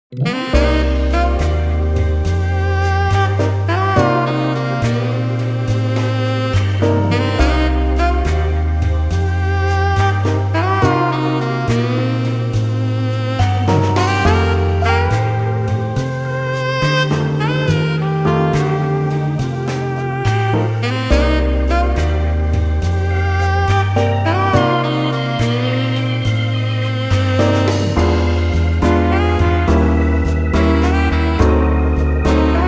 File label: muscle blues